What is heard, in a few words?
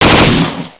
mp5 fire; svencoop